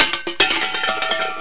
CLATTER; IMPACTS

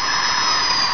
ELECMINI, MACHINES

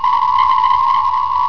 sonar

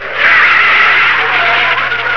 SKID MID; TRANSPOR

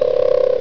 bird coo; ANIMALS

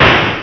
RIFLE

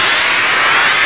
flux mech; EFFECTS

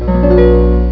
chord

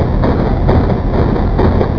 MECHFACT, MACHINES

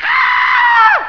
SCREAM

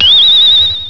PING

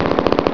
MACHGUN; WEAPONS

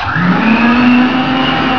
VACUUM, MACHINES

